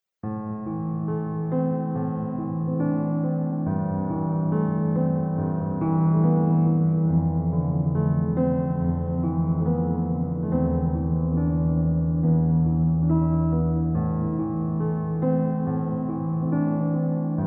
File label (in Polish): pozytywka, 432 hz